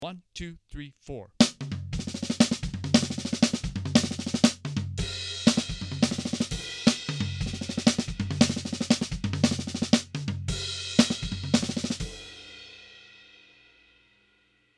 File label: Melody Kit